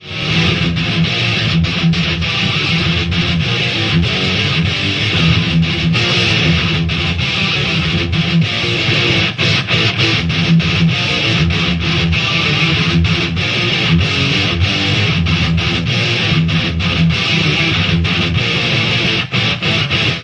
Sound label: Riff